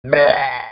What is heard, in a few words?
vommit